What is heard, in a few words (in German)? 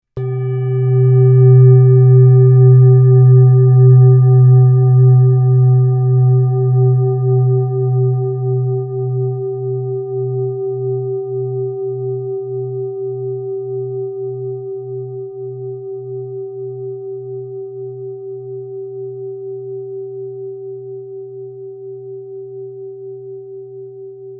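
klangschale sonne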